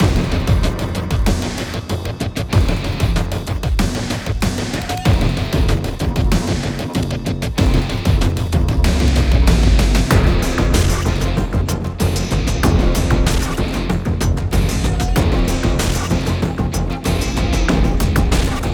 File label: Aircraft Carrier Combat